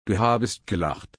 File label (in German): lachen